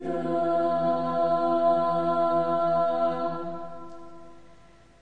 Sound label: Slavic Choir E5th Aah, Slavic Maybe Bulgarian Choir; VOCAL